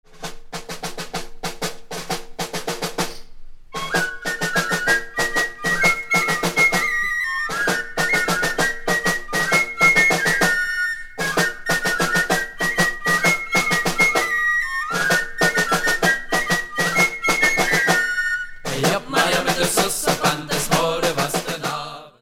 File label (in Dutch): Hop